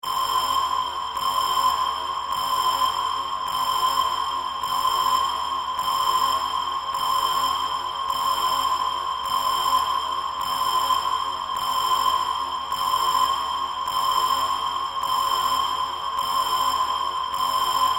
Science Fiction Space Station Alarm; Spacecraft Sound Effects; Spacecrafts (Sound Effects:Science Fiction); SFX Bible 6

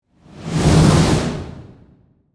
Fireball Burst, Processed; Production Element, Imaging Element, Accent, Transition; Flame; BlastWave

